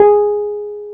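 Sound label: piano, Wurly, Keys of the 60s and 70s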